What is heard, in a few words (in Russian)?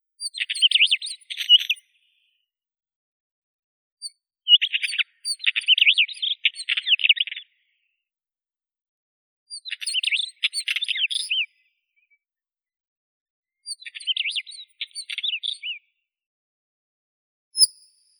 kamenka obiknovennaya oenanthe oenanthe